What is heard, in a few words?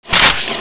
lance field attack start